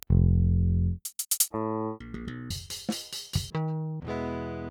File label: Pultec; Virtual Analog